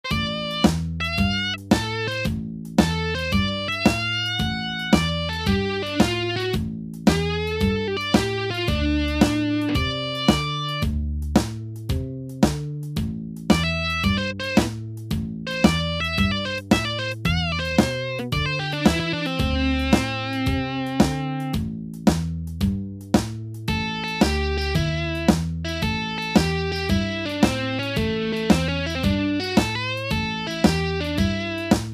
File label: Style Licks